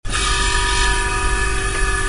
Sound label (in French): Frigo